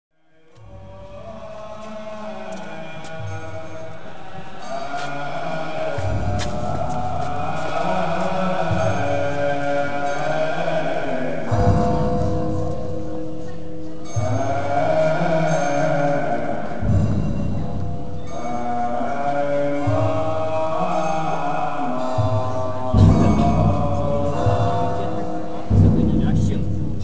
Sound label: monks chanting